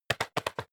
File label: bat wingflap